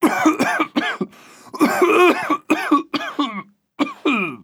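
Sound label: walk, Italian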